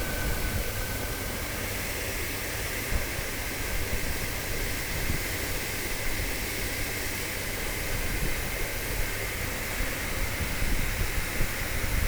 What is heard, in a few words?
Hand Dryer